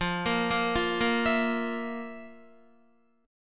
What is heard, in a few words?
F Major 7th Suspended 4th F, Guitar; FM7sus4 arpeggiated; JGuitar